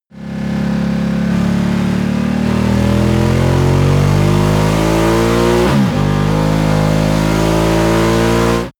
motorcycle ducati cruiser accelerate short

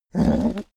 pig grunt excited

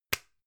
gum plastic box close hard

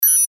future communicator signal

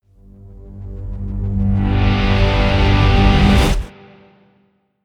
promo pad

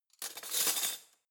cutlery spoons drawer place soft

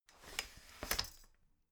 folding chair fold medium

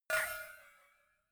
cowbell clank soft

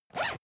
zipper use fast

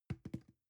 plastic can small fall bounce soft

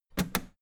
old button harsh ringing push hard

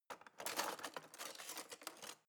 knife wood drawer handle rattle long

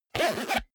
zipper small case close fast

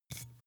glass bottle handstroke soft

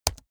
keyboard laptop space press fast hard

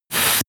air pressure release

